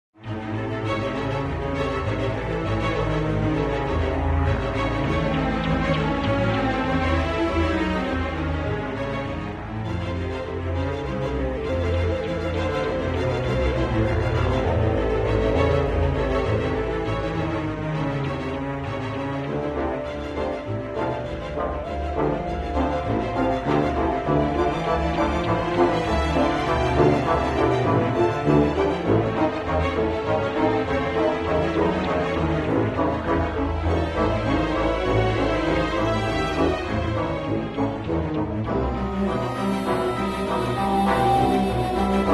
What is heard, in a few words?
Loop; Gortys